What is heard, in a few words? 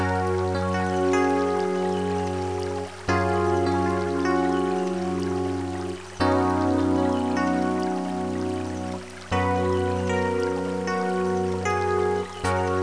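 background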